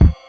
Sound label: Rhythm Kick Ride Tail, Realistic Concertmate-670; Rhythm Layered Kit